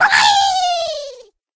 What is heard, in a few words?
Globe Bird Launch; Angry Birds